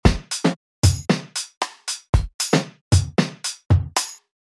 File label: full beat dry; ampedstudio; How to make a beats